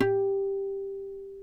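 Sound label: G3 HARMONIC, CLASSICAL GUITAR; Northstar - New Gold VOL-2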